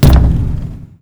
detainer; endless sky

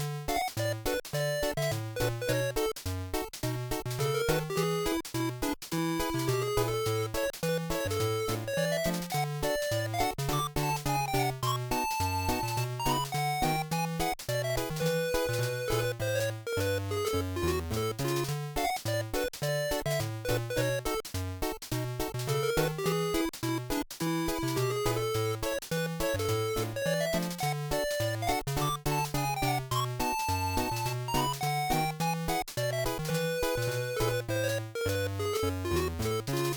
tavern, music; project hawk

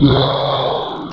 growl; project-hawk

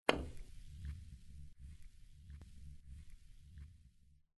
cuestick; agent 8 ball

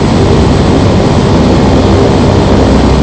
turboprop lo power; rigs of rods

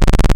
tank move; tanks of freedom; units

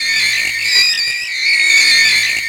tire squeal; stunt rally; surface

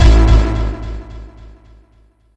tick

